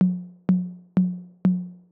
tr-8 hi tom 808 style

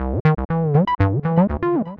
TB303 like pattern 120 bpm

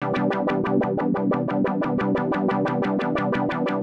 FX pulse

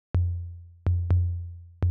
tr-8 low tom 808 style

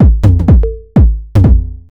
tr-8 kick and toms loop

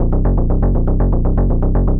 Elek7ro Synt Bass 120 bpm; TAL

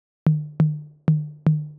tr-8 mid tom 808 style

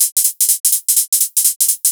tr-8 hi hats loop

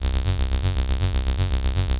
TAL Elek7ro Acid Line 120 bpm

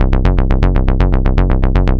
TAL Elek7ro Italo Line 120 bpm

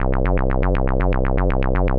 TAL Elek7ro Acid Line 120 bpm

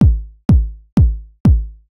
tr-8 kick 909 style

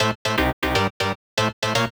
90s Style Synt Stab Loop

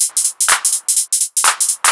tr-8 hi hats and clap loop

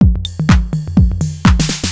tr-8 full loop

